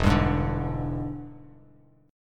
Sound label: E 7th Sharp 9th E down Bass Standard; strummed; chord